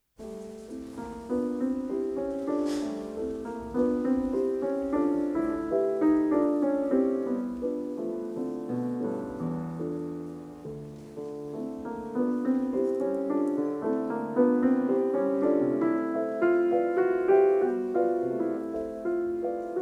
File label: for piano, Allegretto; Visions fugitives